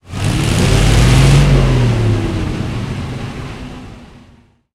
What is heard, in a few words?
maus engine load, engines tanks; War Thunder